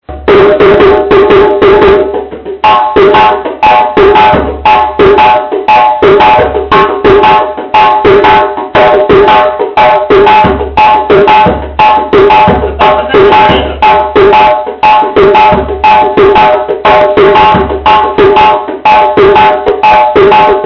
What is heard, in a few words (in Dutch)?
Kadan Ritme